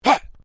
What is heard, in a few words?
MALE VOCALIZATION HAA; Exclamations; Voice